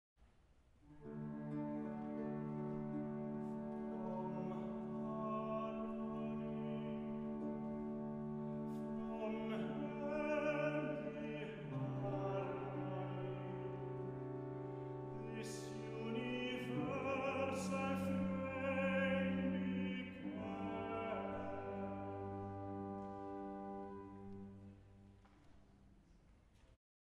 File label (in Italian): Recitativo; Haendel-Konzert